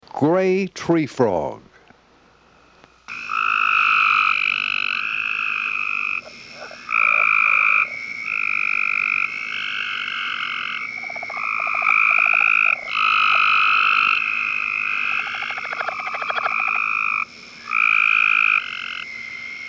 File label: Gray treefrog (Hyla chrysoscelis); Family Hylidae - Treefrogs